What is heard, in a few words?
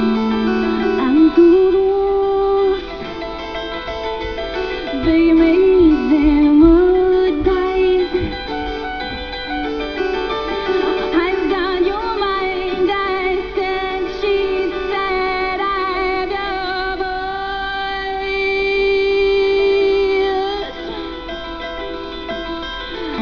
BELLS